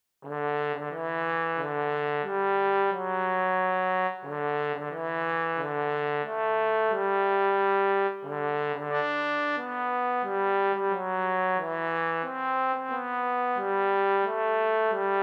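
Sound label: solo trombone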